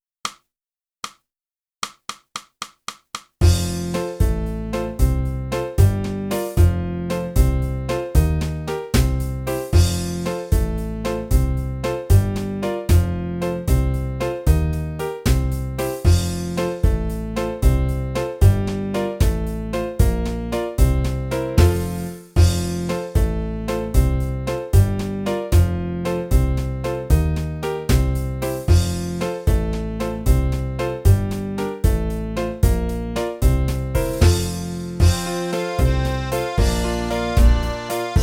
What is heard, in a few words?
flute; boosey